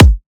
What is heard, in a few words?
Riddim Kick